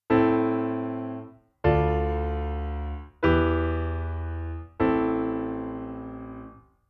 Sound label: kadenz; chord functions